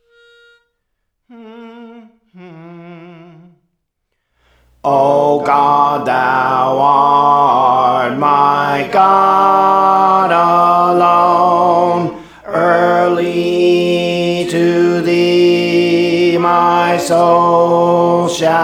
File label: Alto, Songs; Praise For The Lord Training